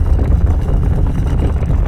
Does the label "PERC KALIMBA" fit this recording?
No